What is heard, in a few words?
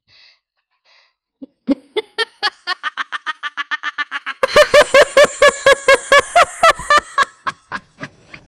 a contagious laugh that starts slow and builds up